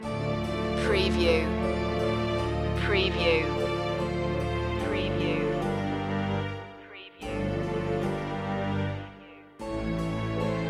adagio in d minor loop

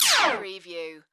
Laser Shot

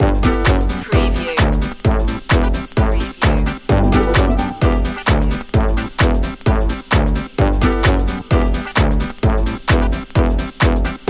doo wop bop loop

